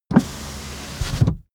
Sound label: car window front close part